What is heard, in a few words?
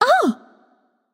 surprised light; smite; voicepack; hel